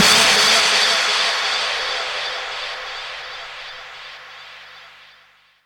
FX Downlifter

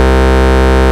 Ultimate Bass Root C; Vengeance Samples

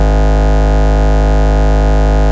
Ultimate Bass G# Root G#; Vengeance Samples

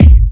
Bassdrums Breakbeat; Vengeance essential club sounds-3

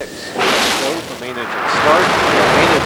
Ambiences, Places and Ambience; Vengeance Samples; FX Vocals

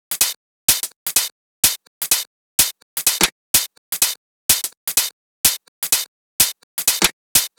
126BPM Drums; Vengeance Samples; Tech Freak Kit

